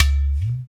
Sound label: UDU; Roland LCDP14 Africa VOL-2; Afro Menu 1